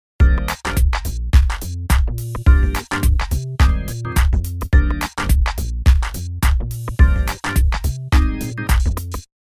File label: hiphop dance